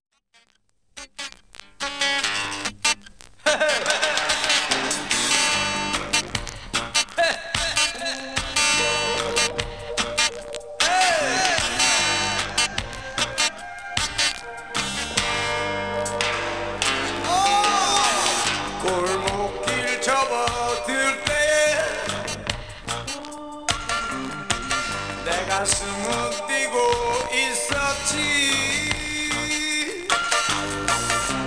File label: BLUES